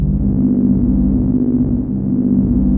JUP.8 G2, JUPITER 8, Rare Synthesizer; Hollywood Edge - Giorgio Moroder Rare Synthesizer Collection

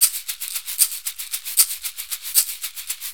6-8 Caxixi, Perc Loops

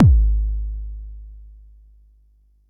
Bassdrum, Jomox Xbase09

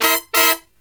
Brass Riffs

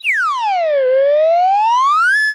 Side Whistle, cartoon Foley Fx; musicradar; cartoon caper

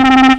alarm, FX & ATMO, ALARMS & ALERTS; Techno Trance Essentials

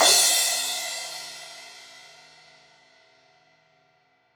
Crash 16in edge, Cymbals; Deeply Sampled Drums

